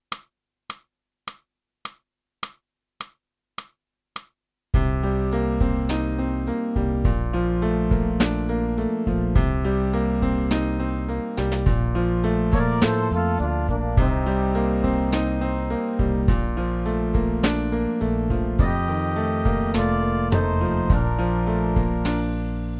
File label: clarinet; boosey method